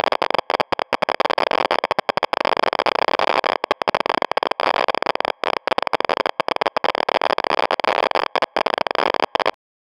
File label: solo rapid repeating nucl; nuclear